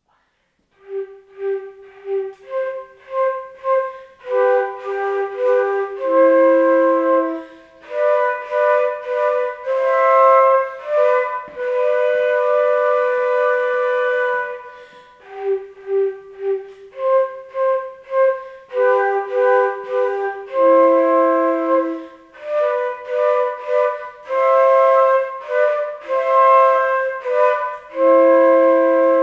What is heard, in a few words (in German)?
Saxophone und Querflöten; Fortgeschrittenenkurs Klasse 5-6